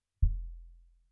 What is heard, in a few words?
BD In